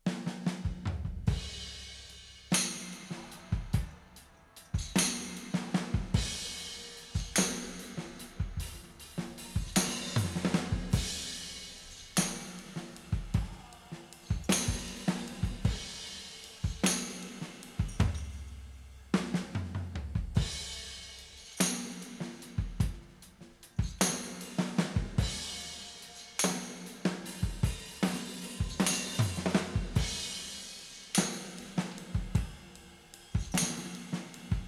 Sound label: drums; mini musdb18hq